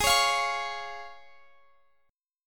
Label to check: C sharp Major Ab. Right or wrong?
Right